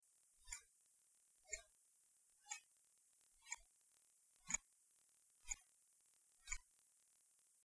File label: breitling b78 movement, watches; breitling b-1; the double-click is the minute's hand moving as well; (the minute's hand jumps every 30 seconds); quartz